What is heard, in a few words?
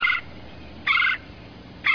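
red-bellied woodpecker, birds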